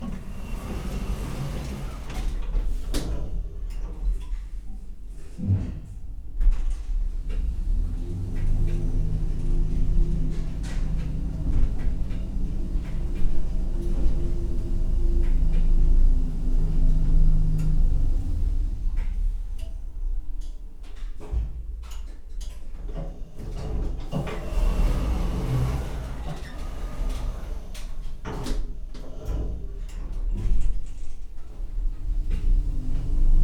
Elevators Modern Sliding Door Airy Light Movement; The Soundcatcher

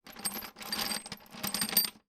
Metal Bolts; 99Sounds; The Warehouse

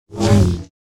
Saber; FXHome

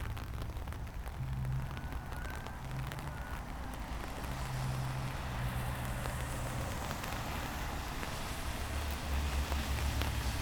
rain traffic; 99Sounds; Rain And Thunder

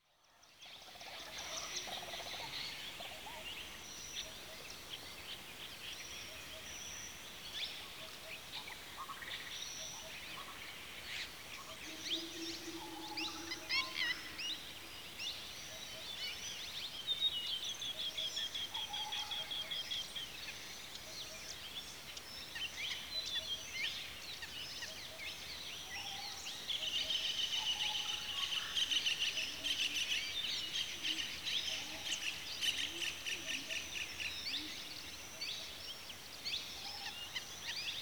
Birds At Watering Hole (Afternoon; India Pakistan Nepal-Countrysid; BBC Sound Effects Library

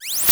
open, Interface Sounds; Kenney's Sound Pack

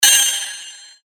Sword Sound; FXHome; Fighting Sounds

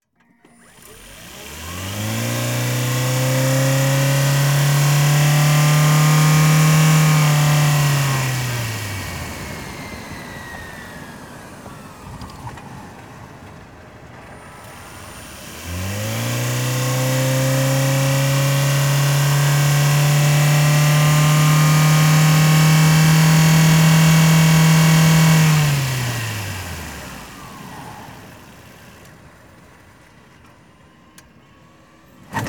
Driving Fast Chase, Prius C (Hybrid); 2014; DPA4061; Secret Source

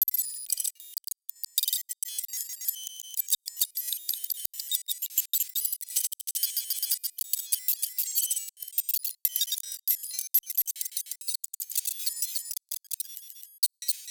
Modular UI - Text Type-Hi Tech; SoundMorph; Richard Devine

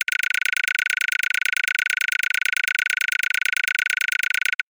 Counter Medium, UI & HUD

